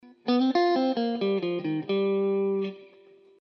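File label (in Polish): zagrywka blues C-G, guitar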